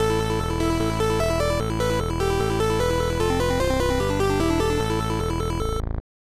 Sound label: Level Start